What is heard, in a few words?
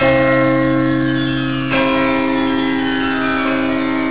ghent, carillons